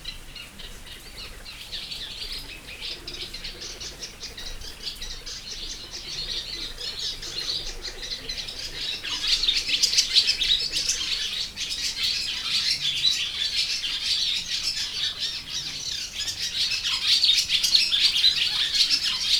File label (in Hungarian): madármegfigyelőház ajtajából hortobágyi halastavak, partifecske; allathangok; termeszetben